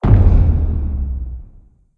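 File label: Win Shockwave Sound; starburstxxxtreme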